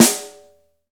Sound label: Snare CROSS, Snares; Roland L-CDX-01; Snares 3; Sn Modules 3